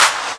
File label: Claps; Vengeance essential club sounds-3